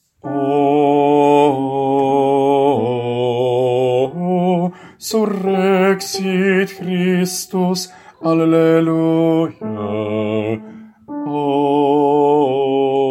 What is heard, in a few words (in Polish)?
BAS; Surrexit